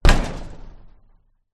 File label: impact metal fence